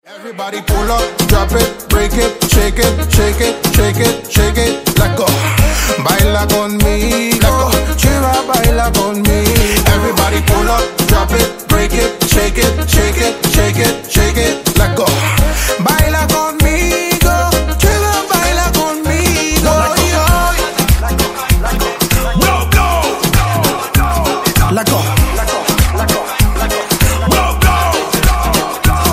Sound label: beat